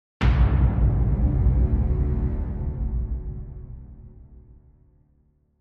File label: Hit Impact Deep String Afterglow; LYN115 Trailer Production Elements